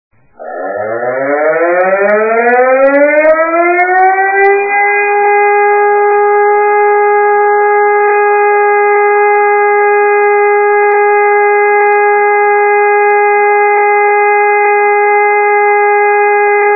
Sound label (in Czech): pozarni poplach sirena